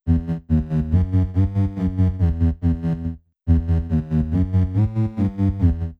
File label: bass split comp